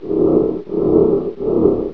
fan, ambient tones; Counter Strike Model Pack; CS:GO

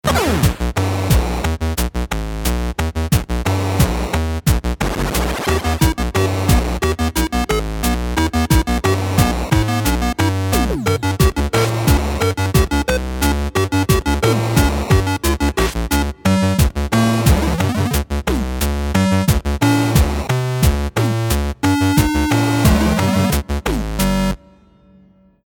choose team

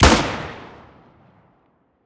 elites, weapons; Counter Strike Model Pack; CS2

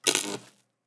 zap, energy

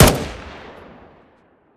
galil, weapons; Counter Strike Model Pack; CS2; galilar

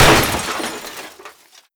break metal box; physics; breaking

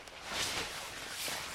foley jacket

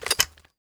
pickup grenade

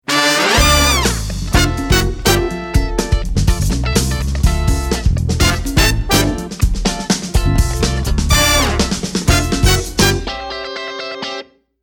start action, music

